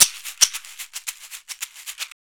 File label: Caxixi Beats; Roland LCDP14 Africa VOL-2